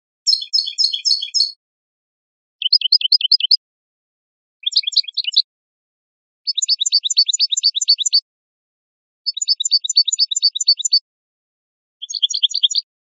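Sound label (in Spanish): floreos grupo b, canario; campero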